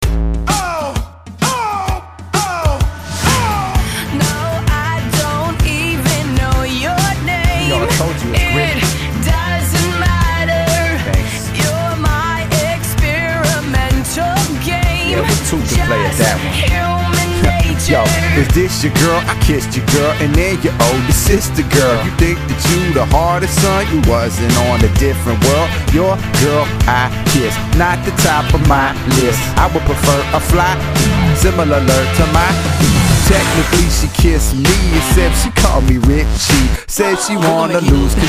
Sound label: rap remix